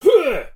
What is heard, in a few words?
soldier battle sounds effort; Menador; SyrinScape; Iris hill manor